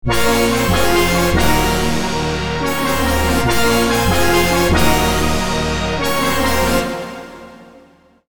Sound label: titan brass 142bpm; vitality vol 10